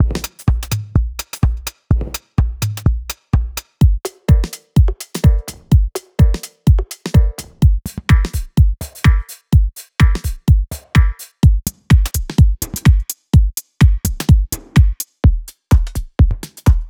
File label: tech house; tech house movement